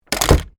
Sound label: Door Close Hard Wood, Foley, Door, Close, Hard, Wood, Closet, Door; Miscellaneous Door; Blast Wave